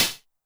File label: CH MOD Tune, CLOSED HAT, Roland TR-909 Modded; 300 Drum Machines; Modded Roland TR-909 Sample Pack